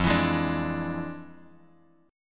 F Minor Major 13th, Bass Standard; strummed; JGuitar

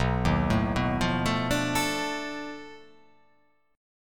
B 7th Sharp 9th Flat 5th, down

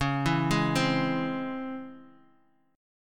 Db Minor Major 7th Db down Guitar DADGAD

